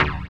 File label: HOUSE, House Dance